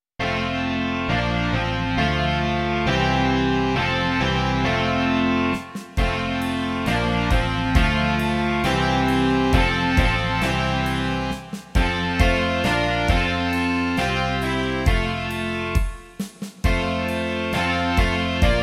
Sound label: rock